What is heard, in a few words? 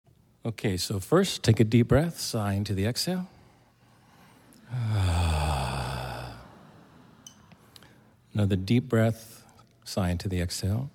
Sound Meditation